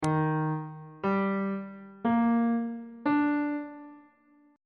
d sharp major 7th chord 1 is d sharp major 7th on bass clef